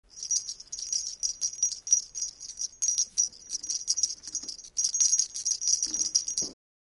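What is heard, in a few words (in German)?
mini glöckchen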